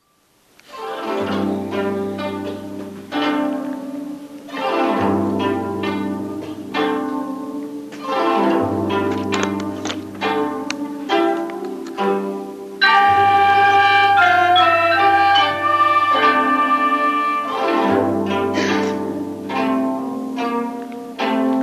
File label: Guzheng Chinese Zither